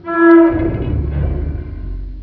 crane creak; Half Life 2; vehicles

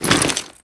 foley step, soldier; combine; soldier common

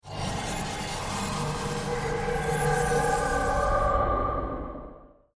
deathcam; halo

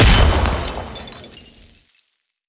death explode, manhack; npc; Half Life Alyx

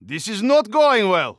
scared emote; Counter Strike; CS:GO; phoenix coop

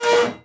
move, hinges drawers; antenna; Half Life Alyx

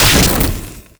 fire start; parking garage; scripted sequences, a4

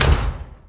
metal door full close; doors

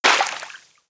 debris water, bullet impact debris

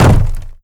land, footsteps, player, wood crate

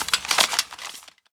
suit, footsteps

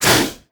attack tell, headcrab black; creatures; vox

